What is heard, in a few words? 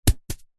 leisure hacky sack footbag land carpet